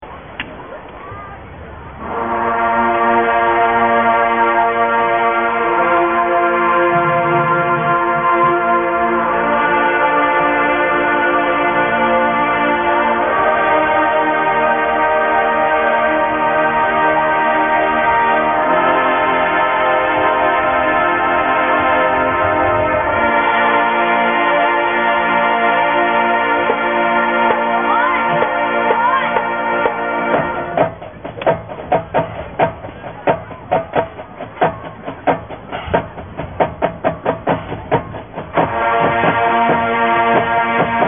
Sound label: Band Warmup; Pitt Drumline Warmups; PB - Band Warmup